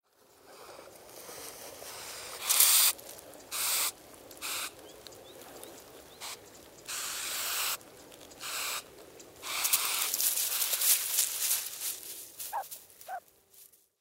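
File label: kiji female distraction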